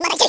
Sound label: ROCK